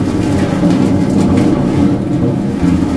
metal box scrape rough loop

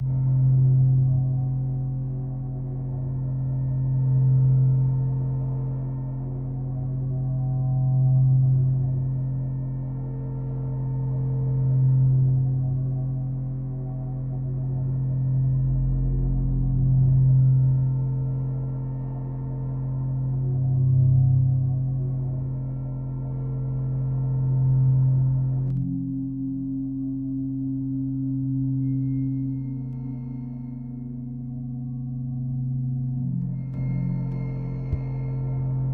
editor drone; Source Engine; Portal 2

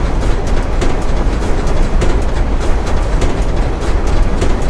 lift loop; video games; doomsday; source engine; tf

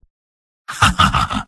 laugh short; spy; video games; source engine; tf; mvm

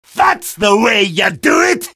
positive vocalization; demoman; source engine; tf

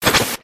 medi shield burn, weapons; tf

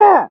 pyro taunt rps exert; tf

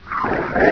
ag idle; agrunt; video games; source engine; bshift

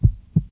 mystery menu command; windows 9x; sound schemes

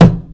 wood box impact hard; Source Engine; video game; physics

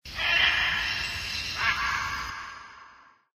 animal call bird; Source Engine; tf; lair

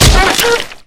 rifle swing hit; video game; source engine; left 4 dead 2; survivor; clown

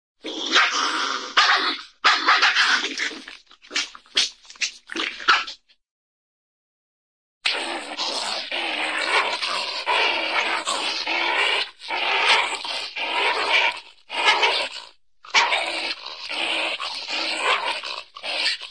badger mean snarl and bark, animal; cartoon

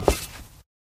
black tile, footsteps

